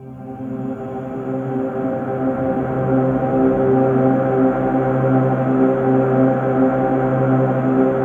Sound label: ATMOPAD; Best Service; XX Large; Pads